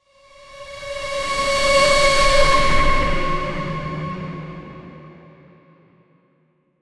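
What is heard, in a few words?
fantasy shimmer rise whimsical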